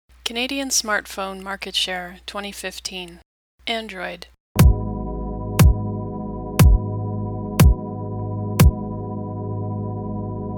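sonification percussive integrated legend backdrop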